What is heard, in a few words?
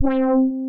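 MOOG MODULAR C4; Hollywood Edge - Giorgio Moroder Rare Synthesizer Collection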